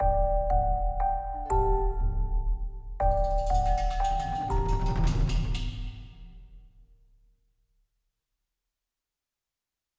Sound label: Action Mutation 120bpm Intro; project zomboid